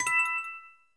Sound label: Coin, Sound effect of Coin